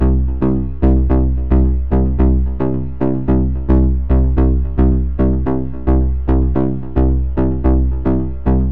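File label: BASS C, Organic House / Deep House Sample Pack Oriental & Middle Eastern; Azura – Organic House Sample Pack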